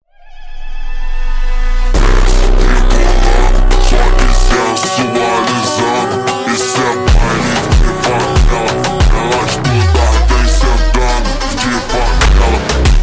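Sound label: super Slowed, phonk music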